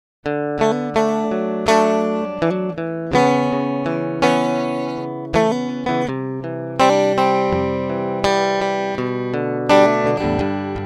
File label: rig dry; guitar